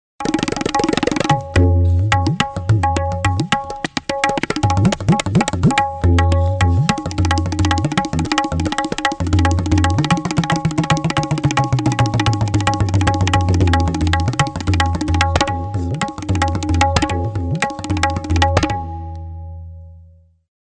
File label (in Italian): tabla